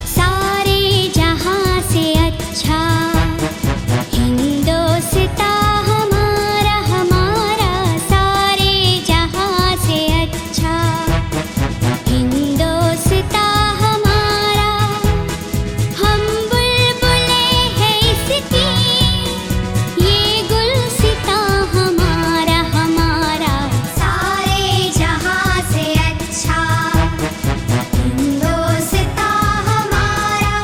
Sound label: Hindi DeshBhakthi Geet Patriotic Song Ringtone